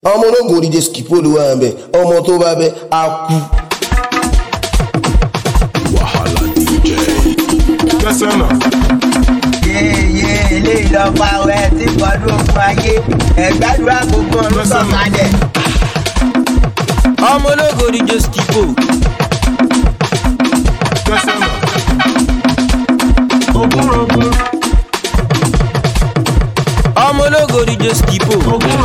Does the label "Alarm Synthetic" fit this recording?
No